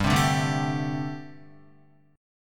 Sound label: G Suspended 2nd Suspended 4th, Guitar